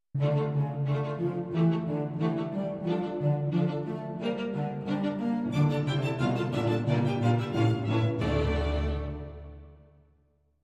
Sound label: Strings Only